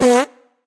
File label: rabbit fart; smite